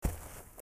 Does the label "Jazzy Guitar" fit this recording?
No